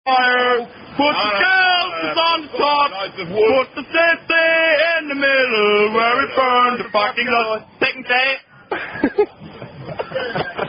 Drunk German Singing Build A Bonfire; Tune Clementine

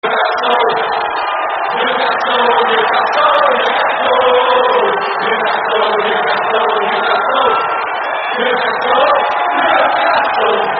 No Claps No Cheer, chants; Newcastle

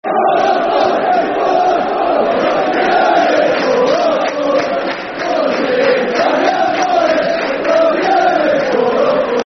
Oh Nottingham Is Full Of Fun Football Chant; Nottingham Forest Nffc

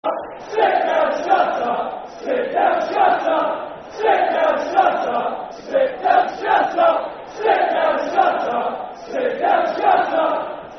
Sit Down, Shut Up Football Chant (Soccer Song); Brighton Bhafc

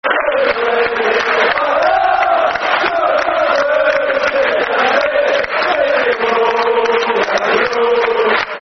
Football Chant (Soccer Song), Man United; United Road Take Me Home

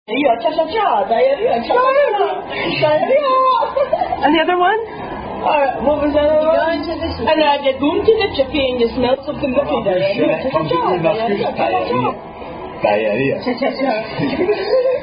Diarrhoea Song

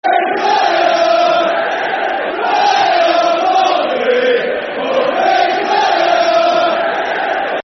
Football Chants (Soccer Songs); Play Up Pompey; Portsmouth Pfc

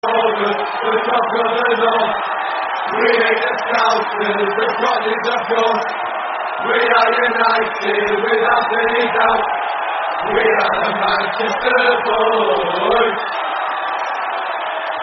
With Crowd; Manc.Boys; Man United; terrace chants

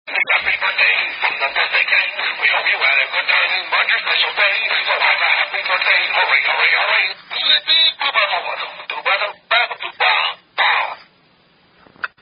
Happy Happy Birthday, Sung By Toy

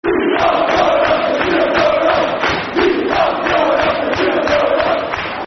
We Are Going Up Football Chant (Soccer Songs); Preston North End Pne

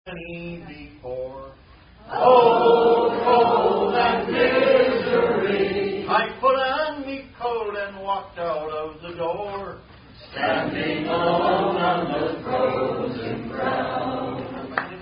Sea Chantys And Drinking Songs; Dance Flurry 2007

